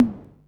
TOM HIGH, drum kit; Polyend; Stazma & Concrete Collage; Concrete-55

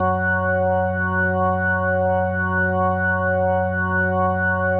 Single Note F#1, Organ; Loops; Single Notes; Sonic Foundry (Sony Creative Software); Joe Vitale Organ Donor

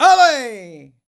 Flmnco M Vox; Roland BK-5

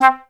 FLS STAC C3; Best Service; Peter Siedlaczek's Advanced Orchestra

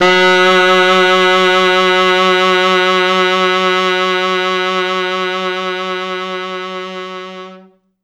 T Sax, f#2

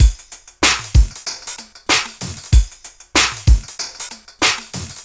Street Tip, R&B Drums

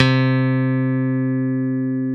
C2 LONG, BASS; D-STIK BASS1

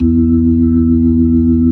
B-3, Jazz

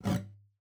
GUITAR FX; Xsample VOL-2

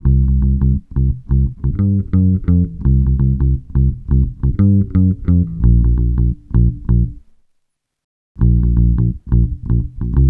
TOUC 86 CM7, Bass Grooves; USB Soundscan vol.30; AKAI

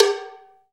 PER COW, Cowbells

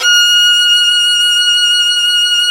Synclavier Strings; ILIO

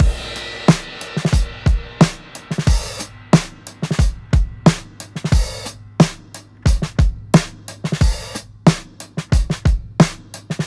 THICK 90 BEAT W CRASH, drums; Basement drums vol 2

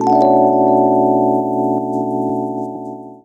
Chords Tones; Equipped Music; Loungin House

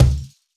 Djembe; Roland BK-5; Percussion; Afro

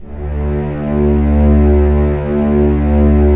STR SC, Roland LA Composer; Roland - LA Composer vol. 4; Full Sec

